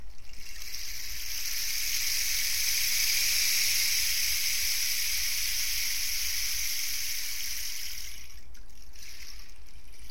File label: rain